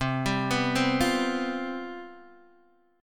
C Major 7th Suspended 4th, C, down, Guitar, Open D